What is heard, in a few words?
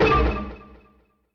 Amb Hit, Drum Machines, Effect; Yamaha DTXpress